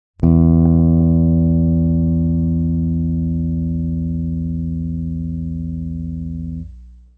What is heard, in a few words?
tune e6, guitar